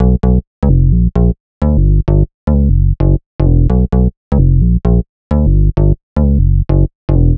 Bass Loop 130 Amaj; Bass Loops 130bpm; Downtown House

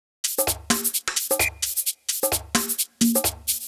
Drum Loop 130, Downtown House; Drum Loops 130bpm

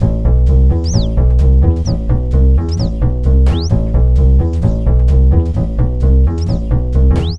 Bass Loop 130 Cmaj; Bass Loops 130bpm; Downtown House

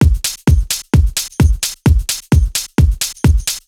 Drum Loop 130, Downtown House; Drum Loops 130bpm

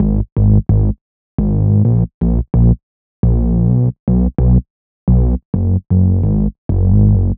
Bass Loop 130 Bmaj; Bass Loops 130bpm; Downtown House

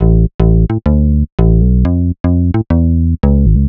Bass Loop 130 Bm; Bass Loops 130bpm; Downtown House

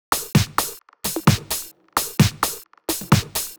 Drum Loop 130, Downtown House; Drum Loops 130bpm

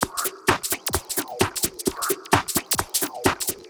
Drum Loop 130, Downtown House; Drum Loops 130bpm

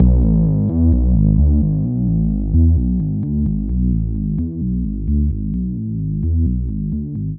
Bass Loop 130 Amaj; Bass Loops 130bpm; Downtown House

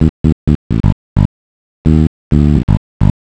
Bass Loop 130 Dm; Bass Loops 130bpm; Downtown House

